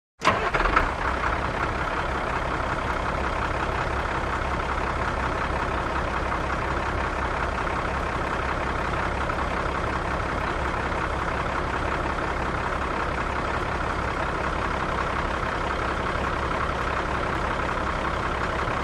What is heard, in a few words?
Truck Start Idle Stea; start/idle/away